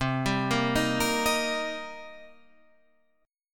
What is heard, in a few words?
Eb Major C; arpeggiated; Eb/C